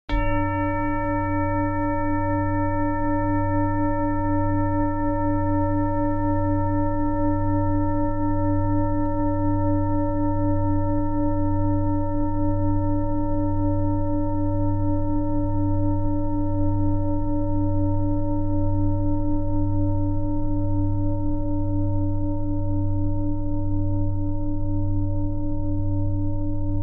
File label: singbowl